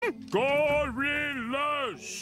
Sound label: gorilla